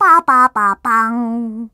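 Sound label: shutdown Windows XP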